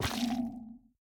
Sculk Sensor place

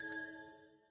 Amethyst resonate

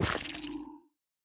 Sculk Sensor place

